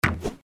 bow release; Keep Out! A Dungeon Adventure for the Brave

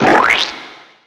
box glue; Wacky Races - Sling Races; NextlevelGames

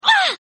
hit; Power Rangers vs Teenage Mutant Ninja Turtles - Ultimate Hero Clash 2; power april

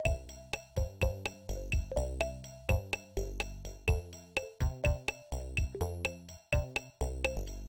bg music; Apple & Onion - Trollydash

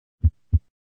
heart; Fighter Aircraft

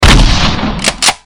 shotgun; Dark Village

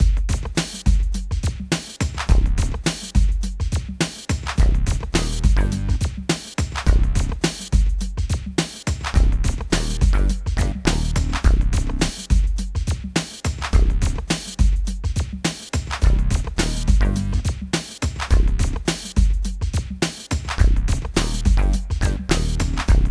title screen music loop; Nickelodeon Basketball Stars 3; ThrillGames

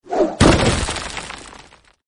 block Pit; Legends of the Hidden Temple - Unlock the Past

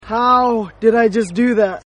win; power blue

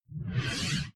portal entry; Henry Danger - Day Of Danger!

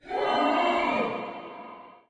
ghoul; Be Cool Scooby-Doo! - It's Dark Out There; ThrillGames

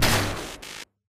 player hit; Adventure Time - Angry Betty; ThrillGames

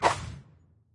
move; The Powerpuff Girls - Trail Blazer; NextlevelGames

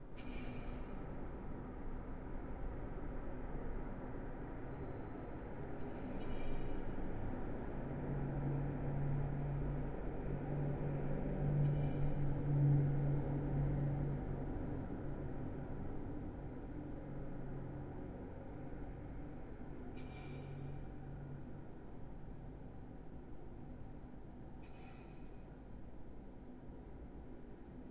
loop ambient track; Steven Universe - Beach City Drifters

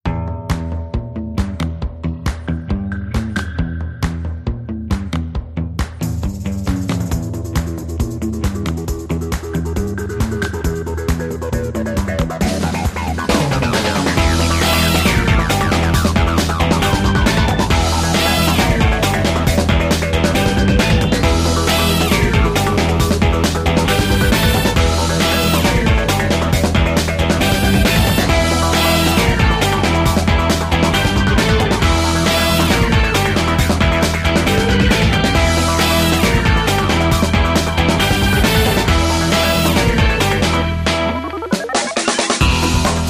music; Ben 10 - Match Up

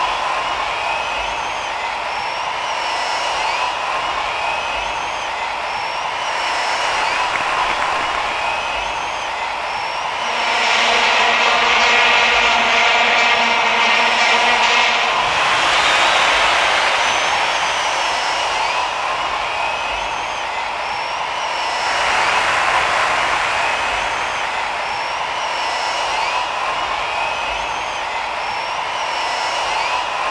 applause loop; Cartoon Network - Toon Cup 2020

